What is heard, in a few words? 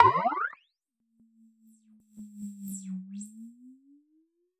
Boing